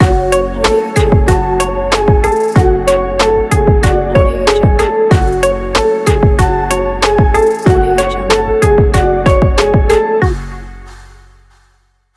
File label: trap opener logo